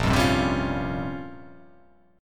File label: A sharp Minor 6th Add 9th A sharp down, chord